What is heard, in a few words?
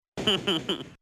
Gay Laugh; BaD Radio